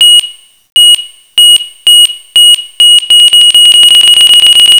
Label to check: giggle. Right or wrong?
Wrong